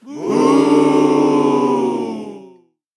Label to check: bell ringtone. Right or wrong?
Wrong